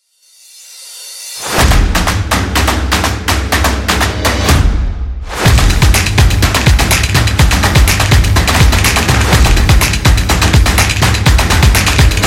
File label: This Is Claps